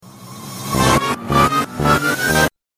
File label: miss music